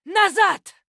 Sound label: Female Human Attack; Hearthstone; датамайн обновления 5.0